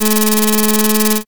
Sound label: slot spin